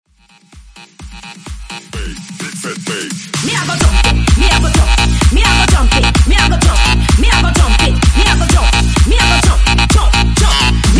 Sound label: Bounce Hype 128 Bpm; Redrum